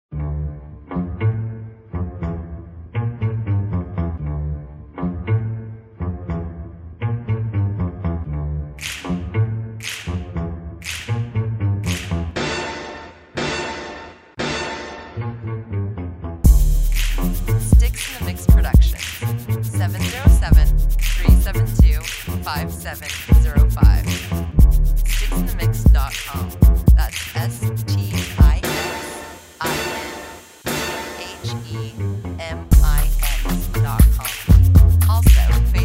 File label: Bossa